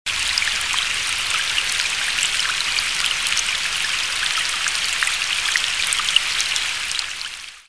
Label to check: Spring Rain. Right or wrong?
Right